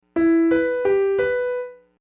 Broken E Min

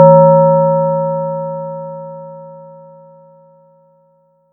fm bell env

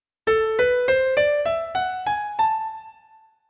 A Melodic Minor

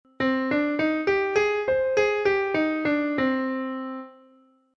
C Minor Pentatonic

